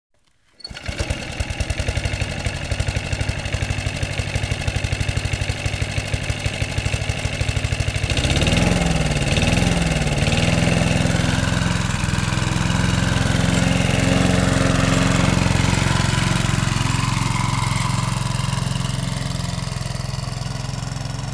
Tondeuse gazon; bruitage; Electro-menager

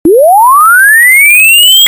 Onde venu d'ailleur

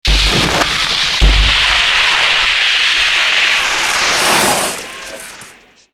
Glisse + arrive saut + arrêt, Ski

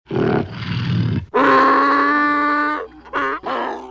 Animaux; bruitage

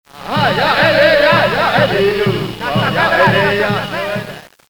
Indiens

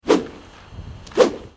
Baton ds l'air

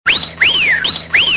Sifflement

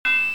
Marteau sur enclume; bruitage; Outillages

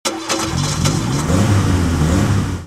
démarrage voiture

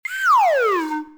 Sons cartoon descendant; bruitage

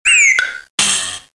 Sifflet

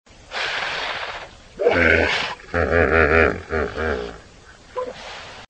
Hippopotame; bruitage

